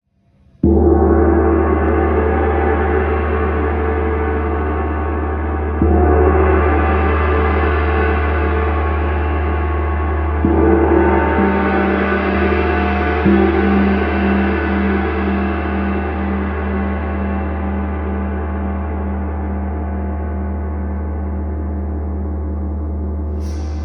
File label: Gong 80; gongs